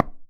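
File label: Percussion Tabla; Drums; Acoustic and Electric; Polyend; Simulacrum Sounds; 1 Shots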